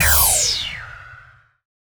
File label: Laser Rocket; Laser Guns; RYK-Sounds